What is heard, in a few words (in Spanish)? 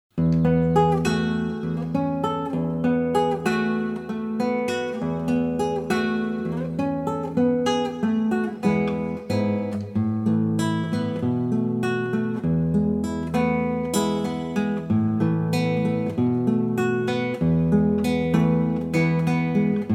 Pista instrumental sin melodia; Romanos 10 15